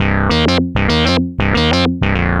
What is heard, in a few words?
ARP Odyssey, Arp A Line, synth samples